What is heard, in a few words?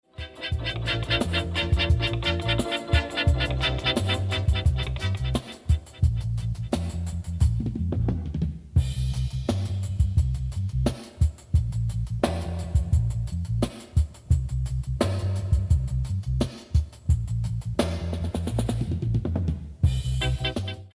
dub